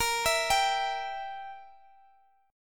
E Diminished A sharp

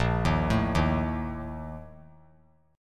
Bb Major 7th Suspended 4th, chord; arpeggiated; JGuitar

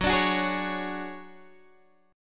D Major 7th Flat 5th, chord

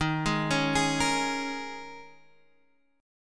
Eb 7th Suspended 4th, Guitar, Half Step Down; Eb7sus4

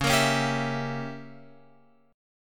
C sharp Diminished 7th C sharp down Guitar Open F; C#dim7 strummed; JGuitar

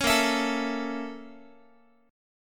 A sharp Minor Major 7th Flat 5th A sharp Guitar; A#mM7b5 strummed; JGuitar

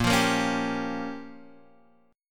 A sharp Minor 6th A sharp

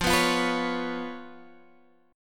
B Suspended 2nd Flat 5th F

